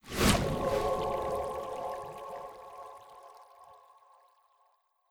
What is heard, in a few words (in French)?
Fontaine